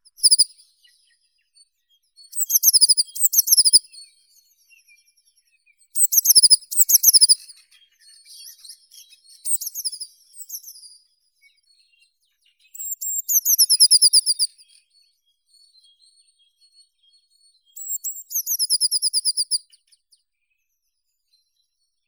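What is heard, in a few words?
blue tit, Cyanistes caeruleus; lazorevka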